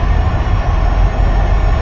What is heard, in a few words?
Plane Jet Loop; Airplanes